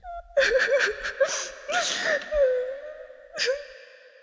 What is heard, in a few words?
cry; Mafie 1